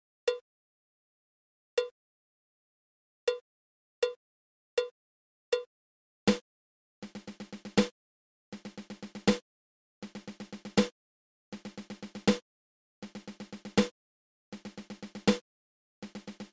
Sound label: Seven Stroke Roll Triple